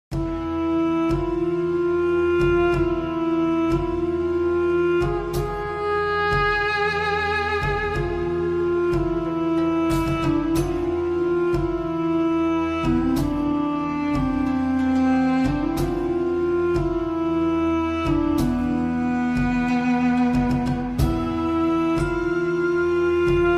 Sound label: Ancient Egypt Music